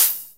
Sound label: HH hh; Best Service Gigapack 2